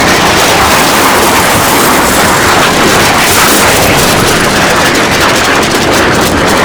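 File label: move proj flame close; rpapa7; gmod; viper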